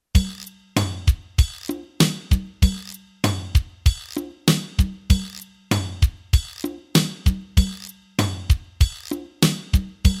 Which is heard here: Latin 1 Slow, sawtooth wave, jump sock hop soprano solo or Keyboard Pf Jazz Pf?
Latin 1 Slow